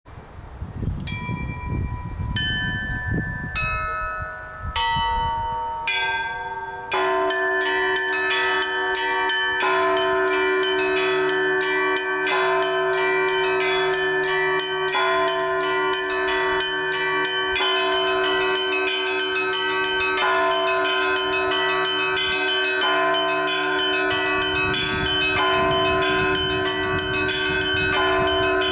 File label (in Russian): Воскресный звон; Звонарь Михаил Угорский